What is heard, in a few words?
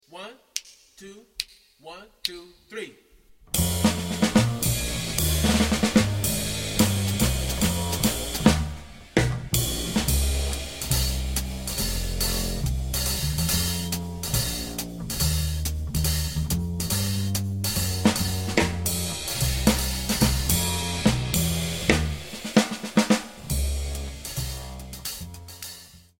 bass drums only